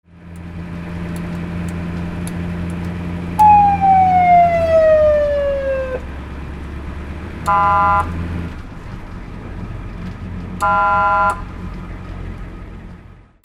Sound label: VEHICLES